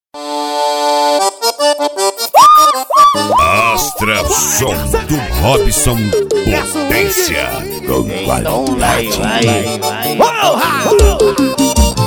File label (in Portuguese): forró sampleado; cd astra som do robson forro e piseiro